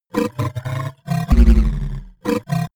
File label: robot monster droid